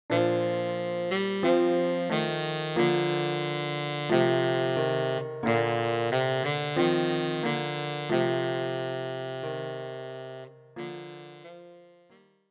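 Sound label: tenor saxophone, für Tenorsaxophon und Klavier; Alle Jahre wieder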